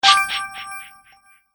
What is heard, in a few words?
Coin Magic, magic sound effects